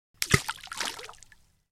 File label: Tiny object dropped in water